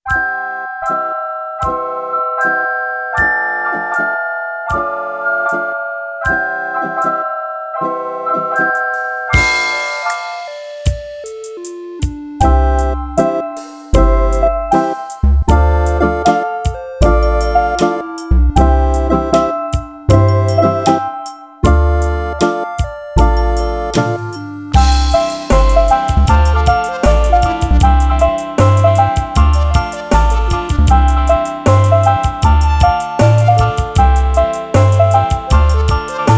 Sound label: i-mobile Ringtone Pop; i-mobile i-STYLE 216